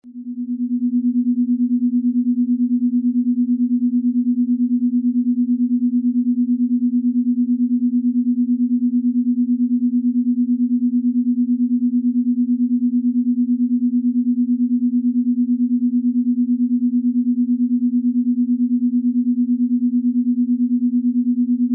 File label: binaural 240Hz 249Hz; binaural beats